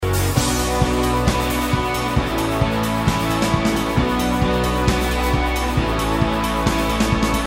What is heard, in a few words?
Double Ride, Drum Sounds